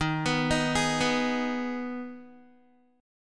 Eb Suspended 4th Sharp 5th, Guitar; Ebsus4#5; arpeggiated

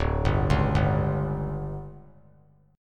D Major 7th Suspended 4th, chord; DM7sus4; arpeggiated; JGuitar

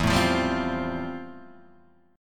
F Major Add 9th E; Fadd9/E strummed; JGuitar

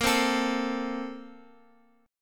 Bb-Major 7th Suspended 2nd-Bb-down-Guitar-Open F; BbM7sus2 strummed; JGuitar

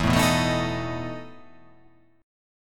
E Major 7th Suspended 4th E

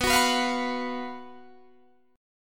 Gb Major 7th Suspended 4th B, guitar

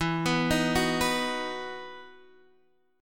E Major 7th Suspended 2nd E; arpeggiated; JGuitar

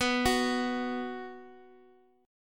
B 5th B down Guitar Drop D

